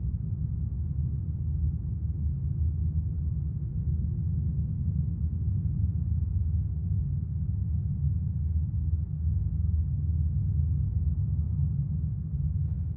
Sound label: tunnel; Original TK Sounds